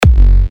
Big Room Kick F#

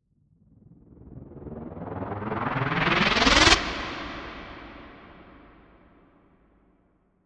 Noise Lifters (128 BPM); Revealed Buildups Vol. 3

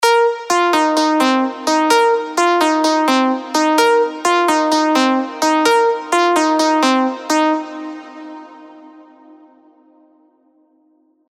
Cmin (128 BPM), prog arps

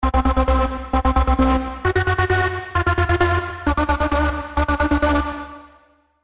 SY Stab; SPIRE; Ragnarok

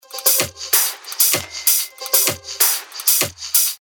128 BPM; Ritzy

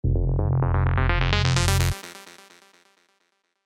Synth Fills F (128 BPM)

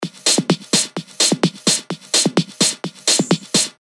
128 BPM

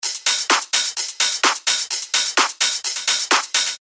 128 BPM

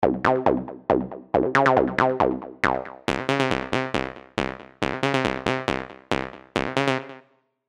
SY Repeat, SERUM ACID

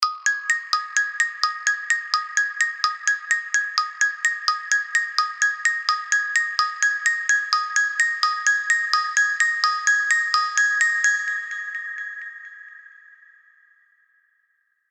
Polar Bell; SERUM ULT PLK

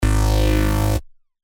Juicy Analog

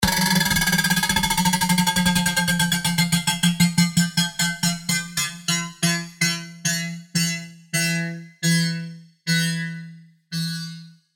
Cool One; SERUM FX

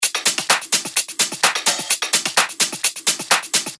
128 BPM

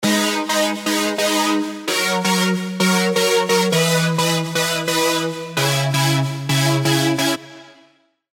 Serum arps; Playful Brutality

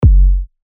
Kick F#; TECHNO; Oregano

